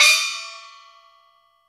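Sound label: SMALL GONG, CHINESE PERC; Spectrasonics - Heart of Asia CD2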